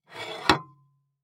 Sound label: Plate Set On Wood Table Setting Table, Household